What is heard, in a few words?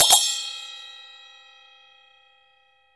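CHINA SM2 R, China cymbal; Spectrasonics - Heart of Asia CD2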